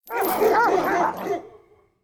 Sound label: Kennel; 0AD